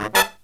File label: LOW CHORD, Killer Brass Riffs; USB Soundscan vol.29; AKAI